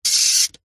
household radiator heater portable timer dial turn set single; zapsplat